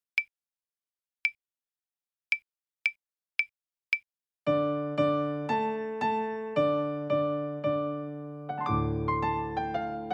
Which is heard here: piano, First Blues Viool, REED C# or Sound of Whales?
First Blues Viool